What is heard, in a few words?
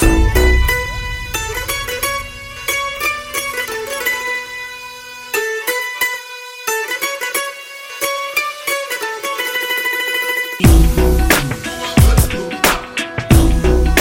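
background music Telugu Ringtone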